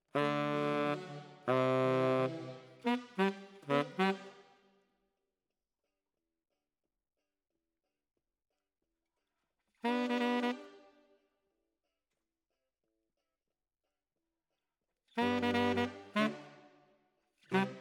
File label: Tenor, RNR for piano